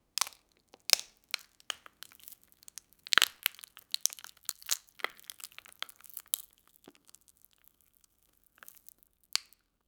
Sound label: knife cuts into rind soft, chocolate